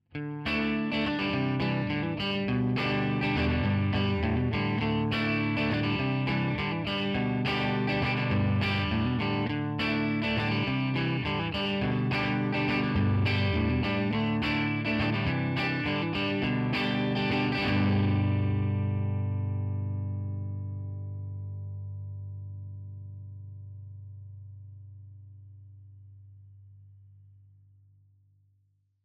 Kytara clean Country Voodo VR 2 Active

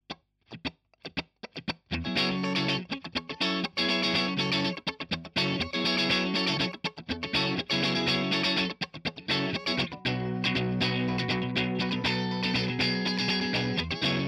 Kytara clean Funky Shure 57

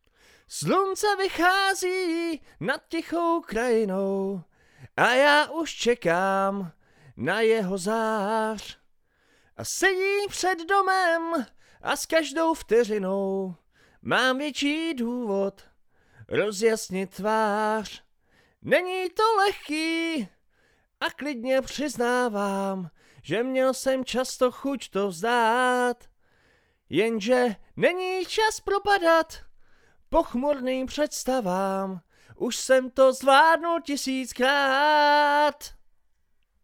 Vokál solo; Voodo VR 2 Active

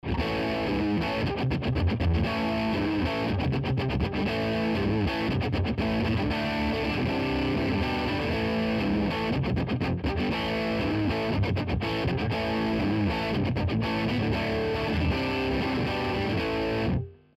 Kytara Drive 1 Voodo VR 2 Active

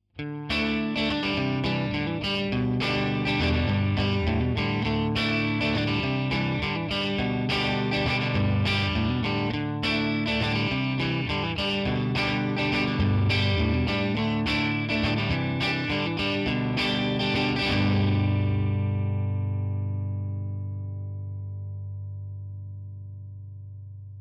Kytara clean Country AKG 440

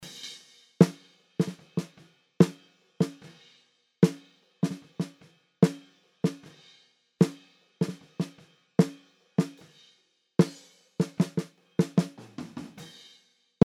Virbl komprese Snare

